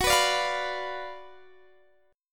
F sharp Major 7th Suspended 4th Sharp 5th, F sharp, down, Guitar, Open D